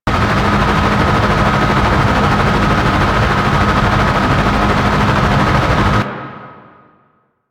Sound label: Fortress Ship Hover Loop; Ambience; Warframe; Grineer Fortress